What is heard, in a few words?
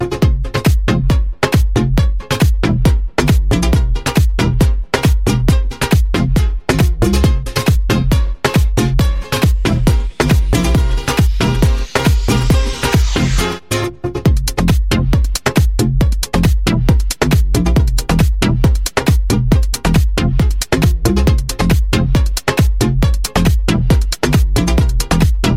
Guitar Remix